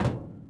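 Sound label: trapdoor walk, footsteps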